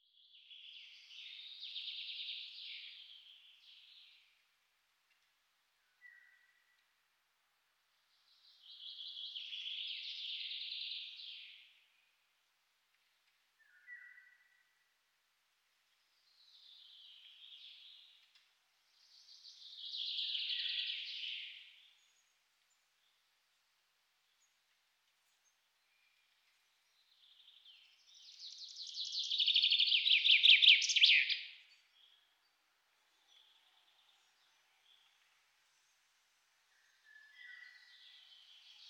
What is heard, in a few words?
Birds Chirping